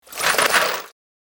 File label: Rummaging through toolbox; hand tool